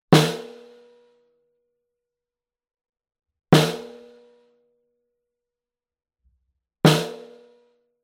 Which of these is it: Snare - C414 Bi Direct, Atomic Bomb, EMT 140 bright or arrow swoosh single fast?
Snare - C414 Bi Direct